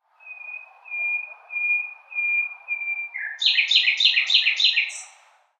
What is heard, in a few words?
bird chirp ringtone